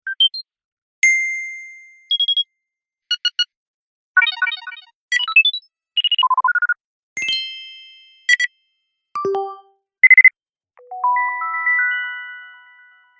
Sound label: Minimal